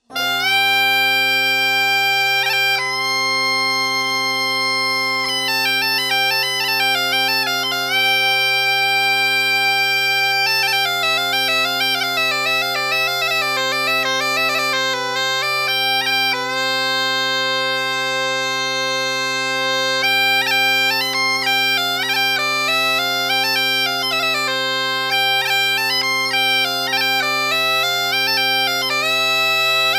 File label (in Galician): gaita